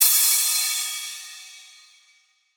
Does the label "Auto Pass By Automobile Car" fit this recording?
No